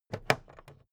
plastic clasp open regular

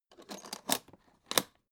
alu case small close

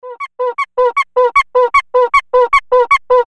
clown horn dampened fast

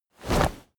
whoosh soft

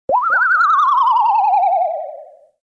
whistling sweep

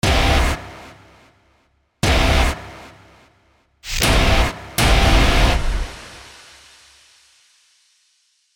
hard distorted ending

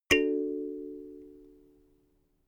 notification kalimba triad major

